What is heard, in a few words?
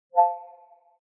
chat User Action Required; accessibility signal